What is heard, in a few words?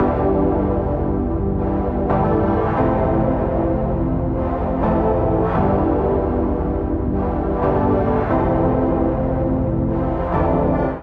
Sponge Cake Keys C Major 87 bpm; Accompaniment; Tonal; MIDI Clips; Drive and Glow; Ableton; Factory Packs